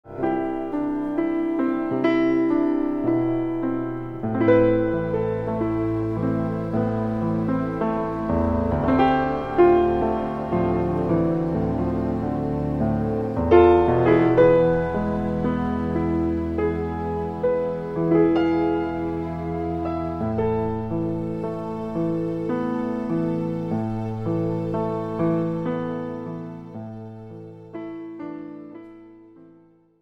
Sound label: PIANO